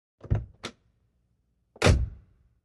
Old Auto Door, accessories doors

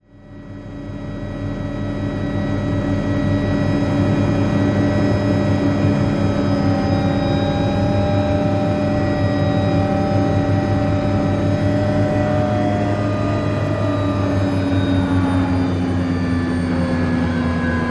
OH6 Loach Interior, little bird

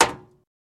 Hammer Hit Auto Bump; hammers

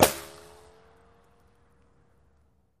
Bullet Ricochet Sin

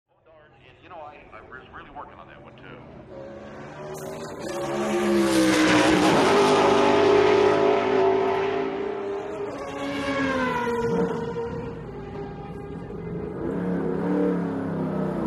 Sports Car Race Pit

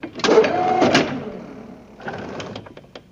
Forklift Kane Leg Up

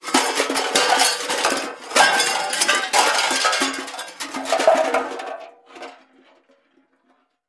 Junk Thrown Junk Pile, junk crashes

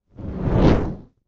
Fire Ball Catapulted

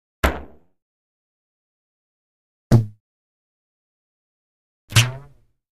Rubber Band - 3 Effects; Rubber Band Boings.

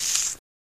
Grapefruit Tear Soft; gore stabs & eat

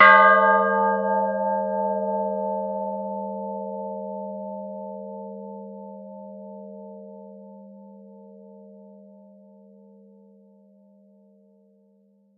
Church Bell Ringing; bell towers

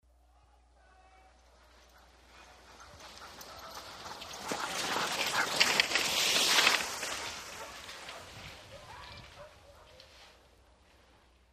Dog Sled 8 Dog Team By

